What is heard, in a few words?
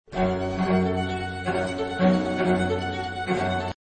G american baroque; MagnaLoops